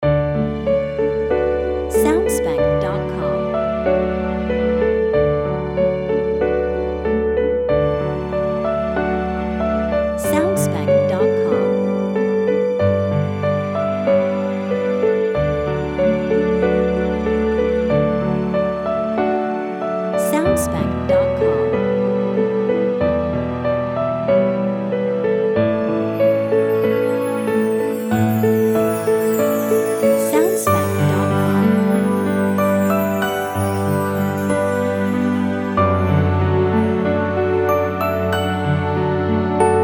Garden of Eden without fountain and birds

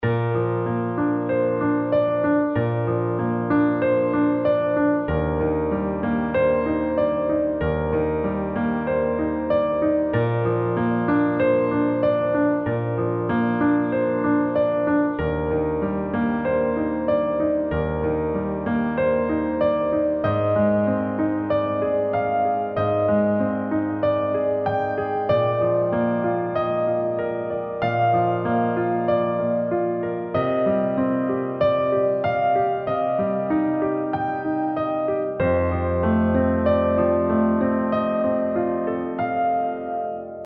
Sweet Piano Loop

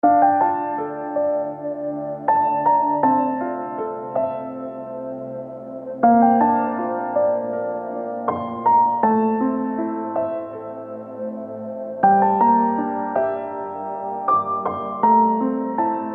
Piano Version

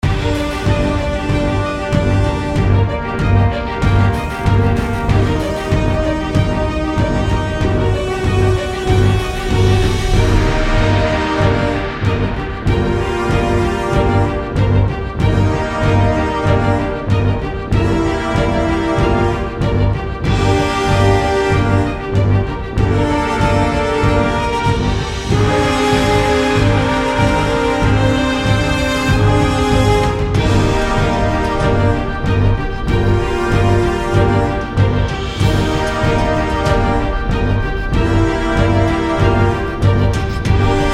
Battle With Demons Lite No Choir

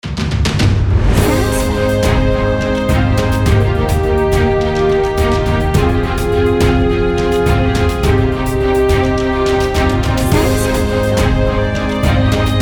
Epic Inspiration Main Chorus

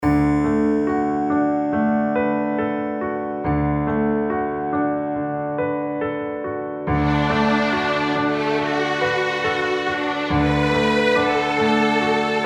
Fairy Tale Love no piano in melody

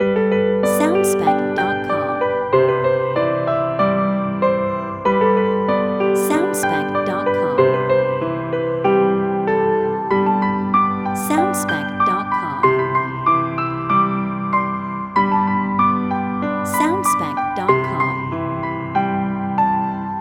Piano On Hold Music

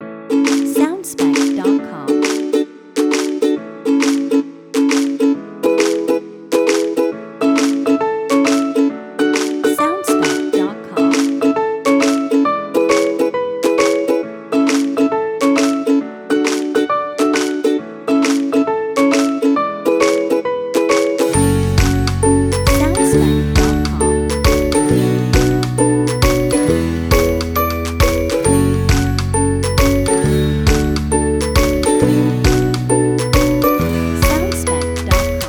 Happy Ukulele Short Version

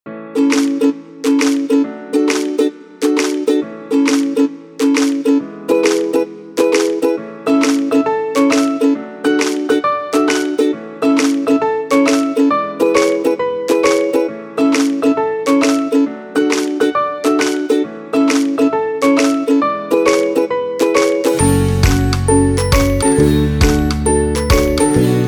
Happy Ukulele C Short Version

